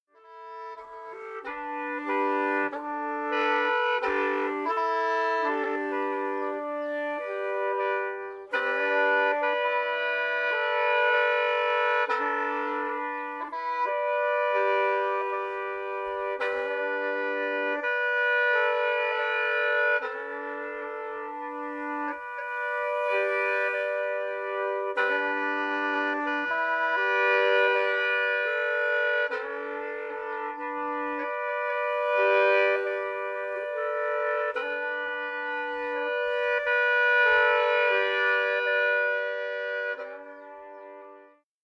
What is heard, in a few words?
Black Lahu courting tune, free reed pipes solo this slow style is for courting music